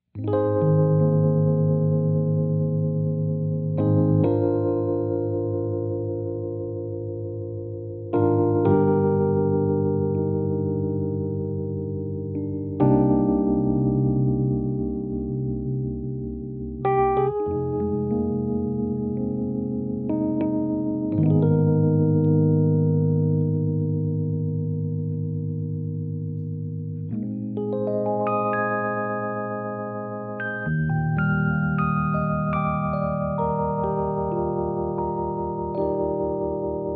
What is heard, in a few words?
Suitcase vari trem improv, electric piano